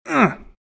hurt; RadwinIsland

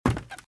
Footsteps - Wood - Creaky - Run - Left

